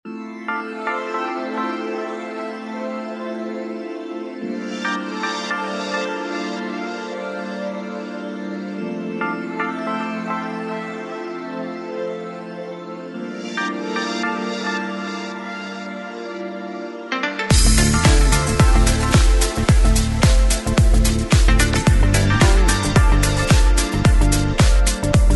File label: corporate